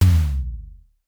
heavy cartoon dogo; reaction

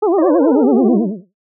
dizzy spiral

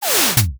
starter camera transition

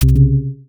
answer wrong

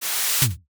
oneshot page flick studio; daily life

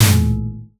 horror sting

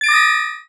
key jingle small

